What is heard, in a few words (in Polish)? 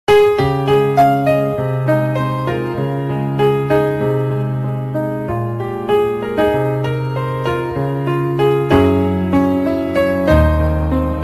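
Samsung, dzwonki; Focused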